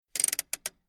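Old Tractor Handbrake Pulled On Slowly Rhythmical Single; Transport; Tractor Sound Effects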